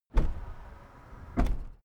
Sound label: car lift down